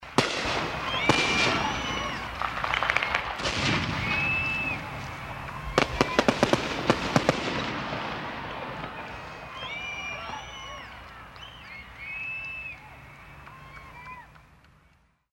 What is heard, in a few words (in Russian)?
feyerwerk; noviygod